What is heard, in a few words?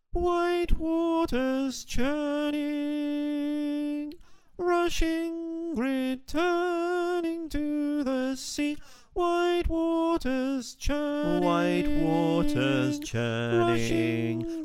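Soprano, choir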